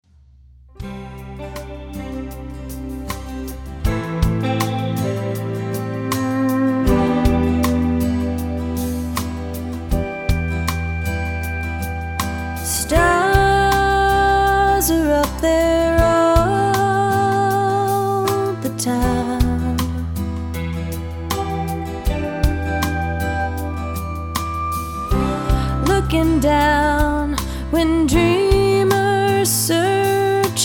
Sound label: female